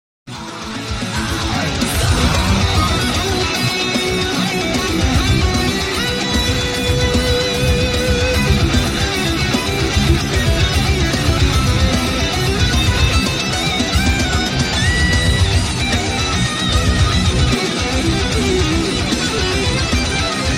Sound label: Guitar Solo